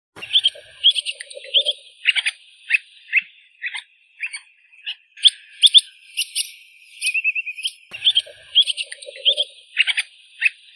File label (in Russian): pesochnik ostrohvostii calidris acuminata; zvuki prirodi